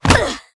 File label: damaged; ArcDraconus F damaged